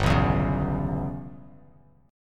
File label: G 6th Flat 5th, Bass Standard, G6b5 chord; G6b5 strummed; JGuitar